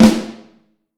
Snare Drum; Q Up Arts; Johnny.C's Vintage Snare Drum; 7.5X14

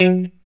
Pop F#3, Strat Pop

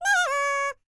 C ASIA, World Voices

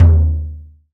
Tam Low; Multisamples; Digital Sound Factory Planet Earth

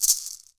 PUILI RATT, HAWAII PERC; AKAI S6000 CD-ROM - WorldSounds

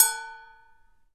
Metal Cup, Drums; PX7; Digital Sound Factory Protean Drums

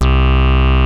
HITEC HI C2, ANALOG BASS; Music Store - Claudius Diemeter Schwerlos

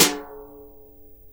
Kit Brush, Drums Acoustic; USB Soundscan vol.10; AKAI; 90s sample CD; ALL BRUSH

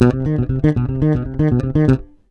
RUMBLE, Ultra Freakin' Bass, 112 BPM; Sampleheads

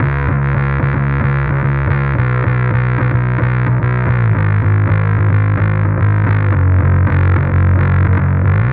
Bass; Sonic Foundry; Not Just Another Pretty Bass

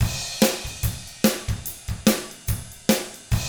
ROCK, Drums; ILIO; Double Platinum Drums 1

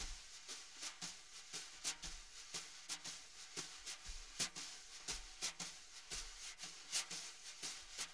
jazz brush loops

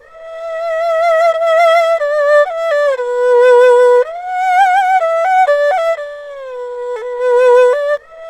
ERHU, 120; G; INIS; Opium

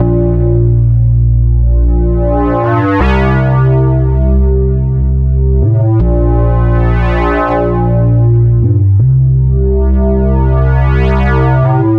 Synth Bass

